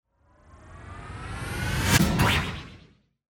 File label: Ascend Burst